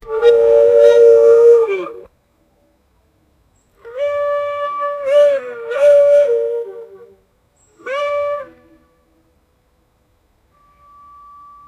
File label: a sound of a mustang, automobile